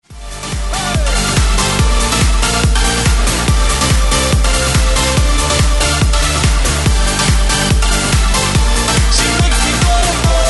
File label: funk rnb hits remixed; multitrax